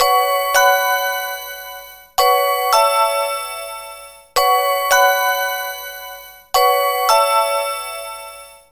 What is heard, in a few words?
Pickup